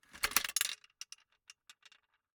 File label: Gumball machine, metal, glass, candy, switch, mechanism, rotate, dropping, single, rattle, wide; Eiravaein Works; Vaeyan IV